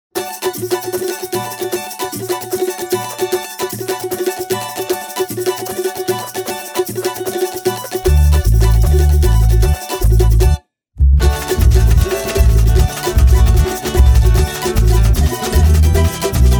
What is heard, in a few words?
karaoke